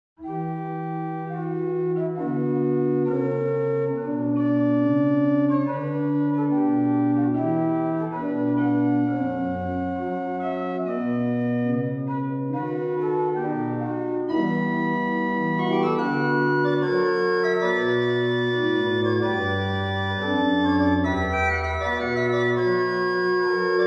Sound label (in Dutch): improvisatie psalm 5; krewerd